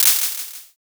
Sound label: clap